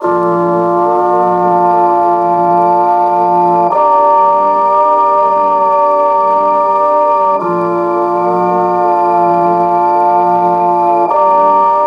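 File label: Melodic Loop Pad String A major 65Bpm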